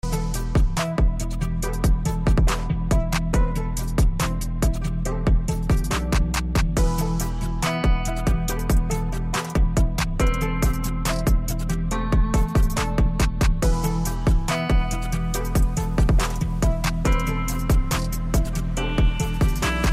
trap; Trap beat in country

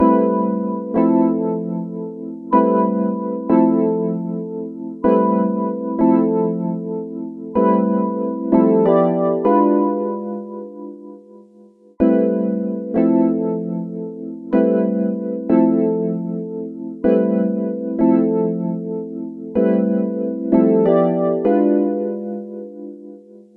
VIBES

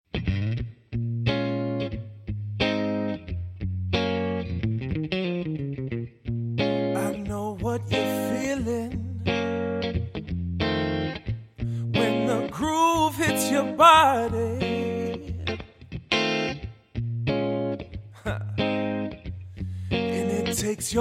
Male Vocal Electric Guitar Mix; manley; Reference Gold

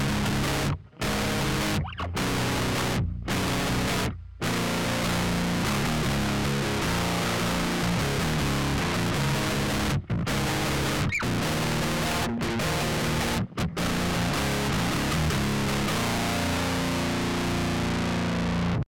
metal

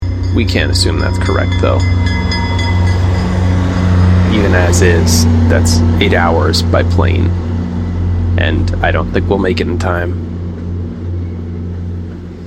De-hum Before; izotope; rx10

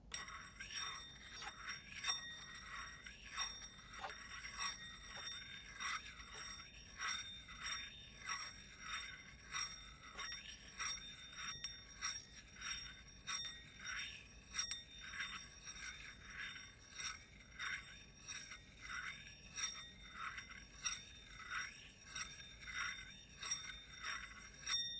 Parts Dish Spudger Rhythm

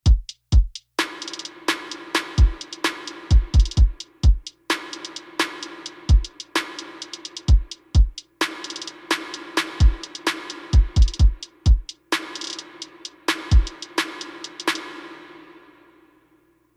predelay; waves

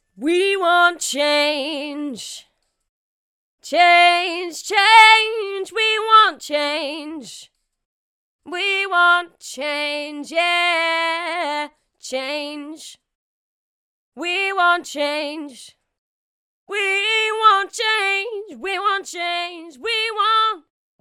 fast transition; waves

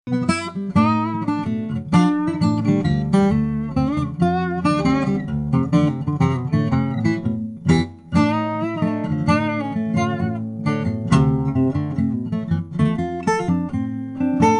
blues, solo acoustic Mississippi Delta blues; suno

